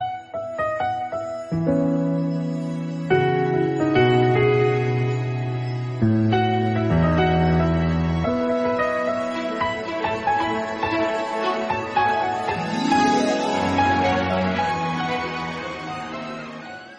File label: instrumental piano bgm; kulfi kumar bajewala